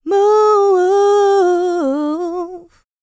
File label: LICKS, DANCE; ILIO; Vocal Planet